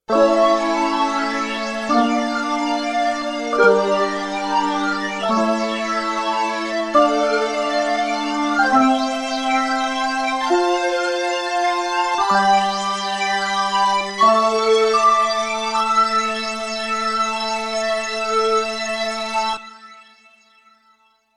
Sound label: eminent 310 equinoxe strings phased